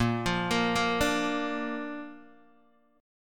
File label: A sharp Major Guitar DADGAD